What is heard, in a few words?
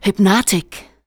vox, Techno Trance; Ueberschall